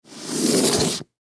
Gift Box Full Grab, Gift Box Full Grab Slide; Foley; Slides; Objects; Blastwave

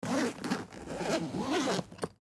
Laptop Case Zipper Unzip Slow; Office; Objects; Blastwave

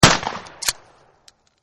Remington 870 Shotgun Single Shot; War; VRSonic

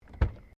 CAR SUBARU LIBERTY EXTERIOR DOOR OPEN

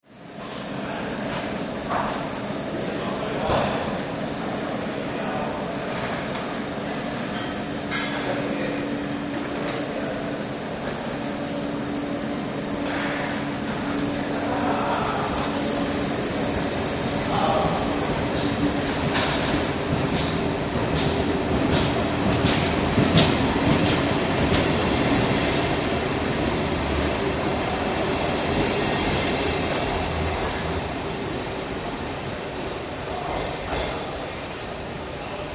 Train Pullout; Trains; Vehicles; Partners In Rhyme

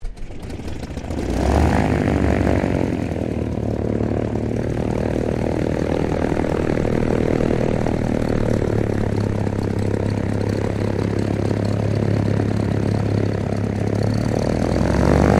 AIRCRAFT PL-12 AIRTRUCK STARTUP TAXI; 1965; Airplanes

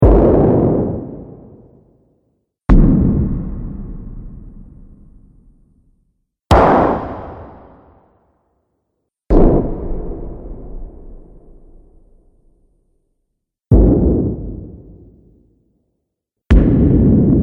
Grenade Explosions; Grenade Sound Effects

